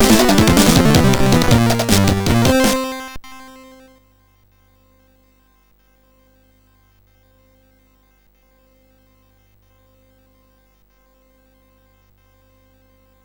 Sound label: MOS6581R4, sid